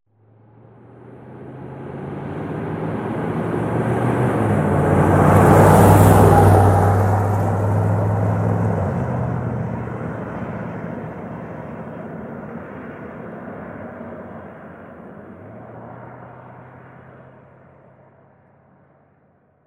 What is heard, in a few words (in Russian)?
variant amerikanskaja mashina